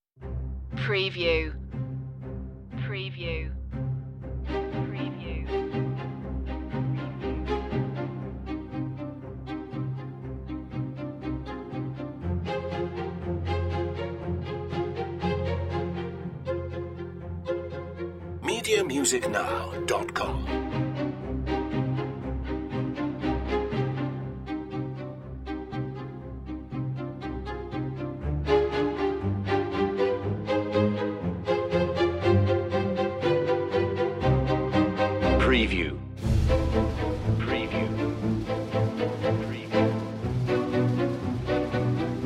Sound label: dance of the strings perc and strings only, Orchestral Soundtrack Mystery, An eerie o